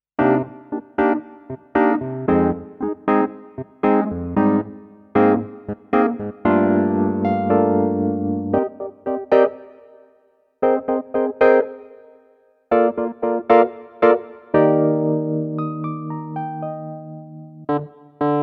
E Piano; V3 Sound Sonority XXL

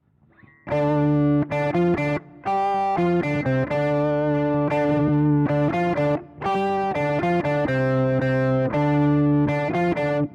Zoom Octave Solo

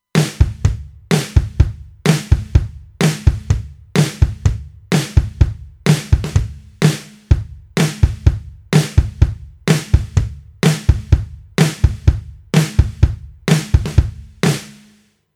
Drums Original

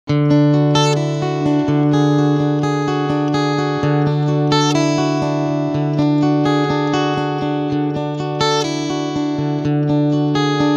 MOOG

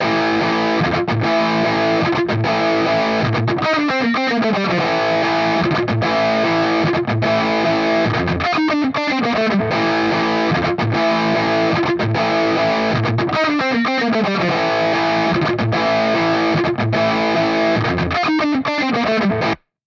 Mesa Boogie Dual Rectifier; Audiovergleich Mooer Nux

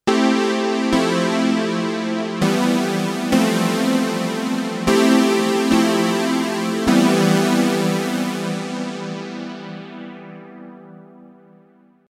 Juno 106

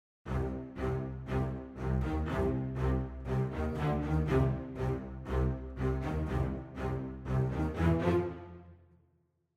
Adagietto Staccato Ensemble

